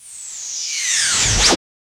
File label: transitions